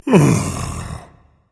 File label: sigh, berserker; cuchulainn